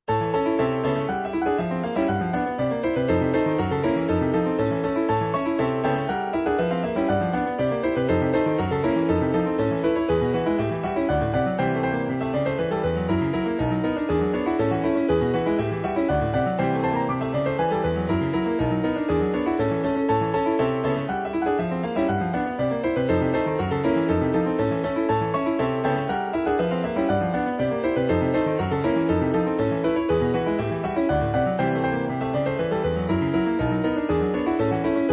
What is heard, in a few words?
Piano folk; Allegro con un certo clangore